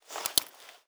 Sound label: unequip; weapons; props dane